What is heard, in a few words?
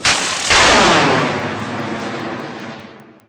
sa-2; mcheli